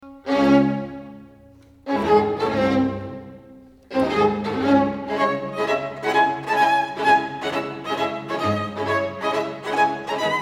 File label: String Quartet in C sharp minor, Allegro; String Quartet No.14; Op.131; Ludwig van Beethoven